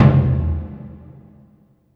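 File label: Burundi drum, Heart of Africa; Spectrasonics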